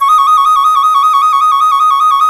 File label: C flute trill